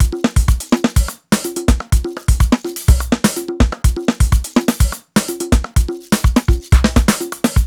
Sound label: top busy, 125 Beats